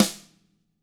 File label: TAMA SNRD RIM; ILIO; Double Platinum Drums 2